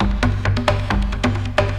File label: MOVE LOOP, Techno Trance; E-MU Formula 4000 Series Vol. 2